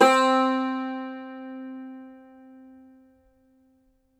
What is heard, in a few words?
cumbus saz C, plucked strings